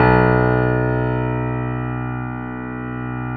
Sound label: B 0, Keyboards Acoustic, GRANDP ST; USB Soundscan vol.17